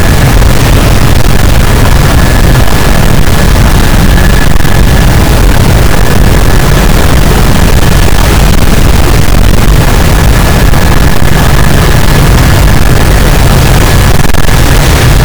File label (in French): cachalot, gaussian noise; Physeter Macrocephalus